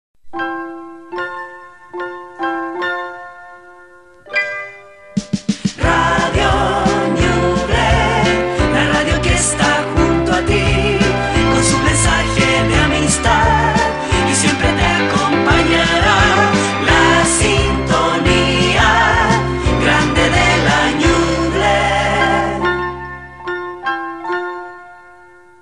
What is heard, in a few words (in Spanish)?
Radio FM AM Jingle Identificatorio; Radio Ñuble 897 FM y 900 AM de Chillan